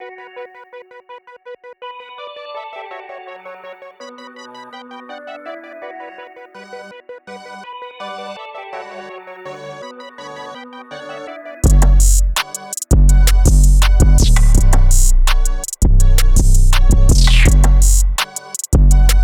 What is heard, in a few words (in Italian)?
base rap; acquabook